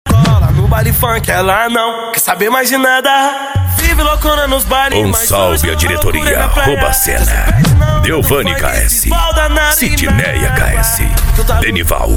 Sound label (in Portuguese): funk bass e sertanejo